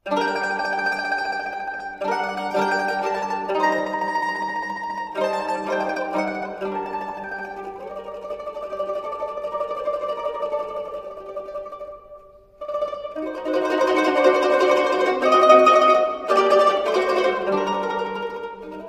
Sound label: Mandoline